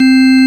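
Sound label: organ Vox Continental; Continental1; Roland LCDP09 Keys of the 60s and 70s 1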